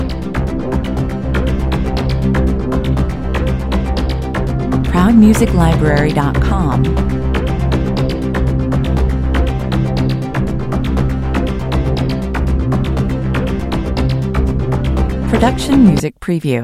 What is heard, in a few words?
goa techno loop